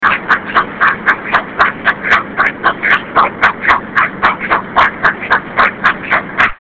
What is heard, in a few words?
rhythmus voice strange